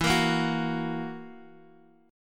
E Suspended 2nd Flat 5th E down Guitar Open D

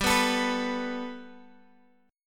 G Major Add 9th Guitar Open F, chord; Gadd9; strummed; 19,18,21,18,x,x

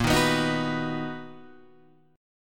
B Major A sharp; B/A#

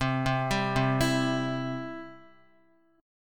C Major, Guitar; arpeggiated; JGuitar

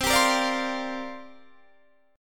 C 7th Suspended 2nd Suspended 4th, Guitar, Open D

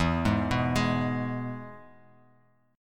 E-Major E, Bass Standard; arpeggiated; JGuitar

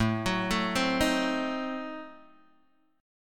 A Diminished 7th A down Guitar Open D

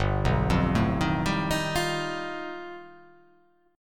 G Augmented 9th

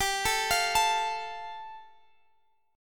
G 7th Suspended 2nd

